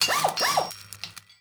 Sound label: metal construction; Rust